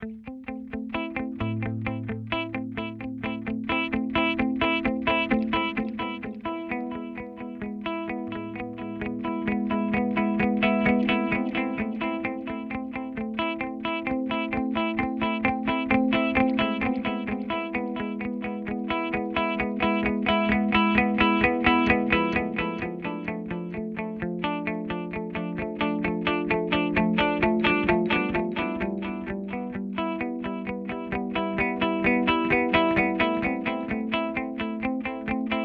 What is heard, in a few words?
Warp Delay; Boss DD8